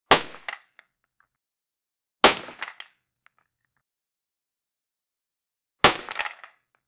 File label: the bullet shell of a, gunshots